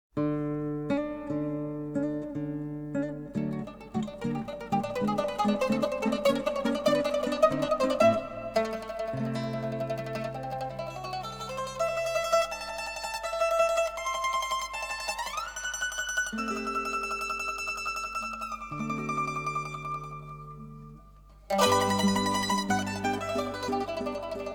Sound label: mandolin